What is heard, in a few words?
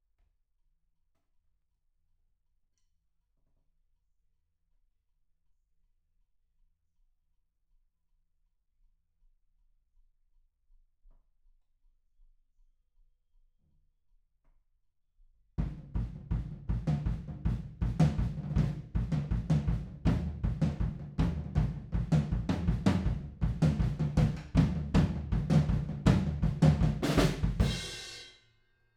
Room, 160 BPM